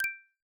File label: channel msg; TeamTalk v5.17 ubuntu22 client; Majorly-G